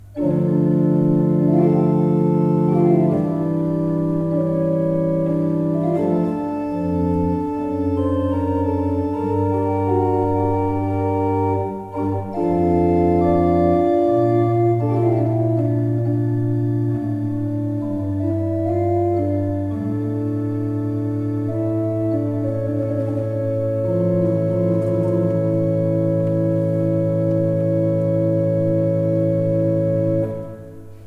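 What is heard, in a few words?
Positiv, organ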